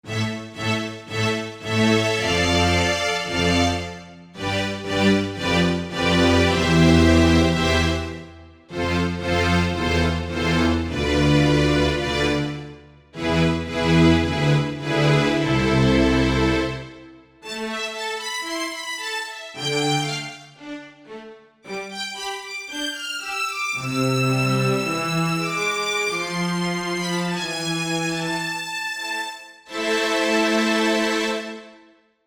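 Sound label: Legato Strings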